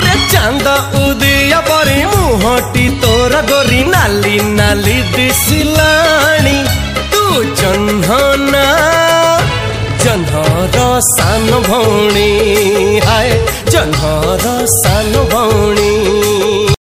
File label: Oriya